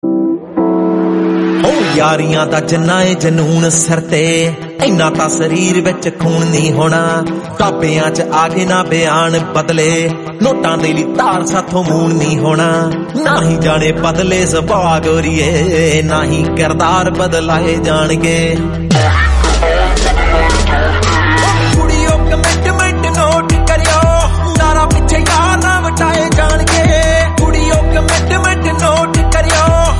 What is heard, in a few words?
attitude song; kgf